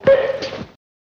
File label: Coconut Comedic Hit